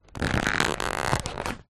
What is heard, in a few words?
foley cardboard box small pressure applied stress creaks